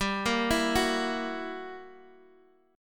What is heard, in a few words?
F sharp 7th G; arpeggiated; F#7/G